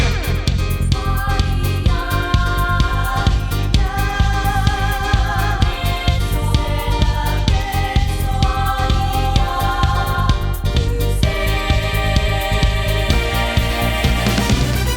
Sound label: Epic Instrumental